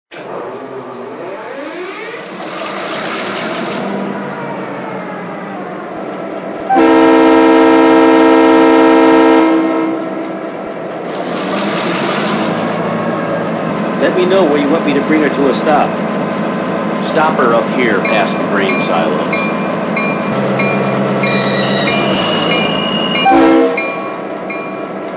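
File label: MP15AC DC, locomotive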